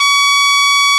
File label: Tuba C4